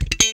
Guitar Slap; Roland BK-5

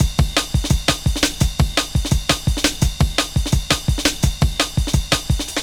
Kick Break, Drum & Bass; Fluid Dynamics

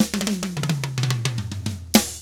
SMBA, SAMBA; ILIO; Double Platinum Drums 1

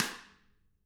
Drums, SNR; CAMCO

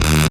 bass, d#, Rave Bass; Dance Synths

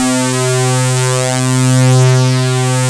synthesizer C2

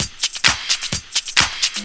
groove, DRUMLOOPS

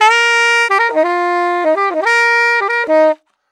Sax 120bpm Eb, Saxaphone; Quazibeat; African Secret

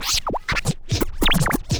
SCRATCHES; Scratch 1

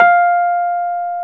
PNO, Electrics; Rhodes; Club-50 - Foundations Roland

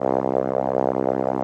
SOLINA, String Machines

